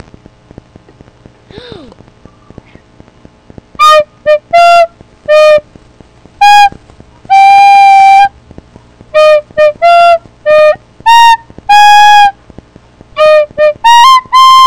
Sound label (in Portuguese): musica parabens e musica da cobra na flauta